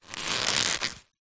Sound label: rope thick old tension movement creak stress